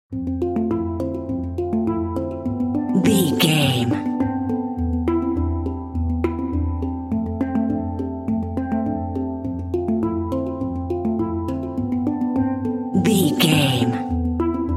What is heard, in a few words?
funky hang drum and marimba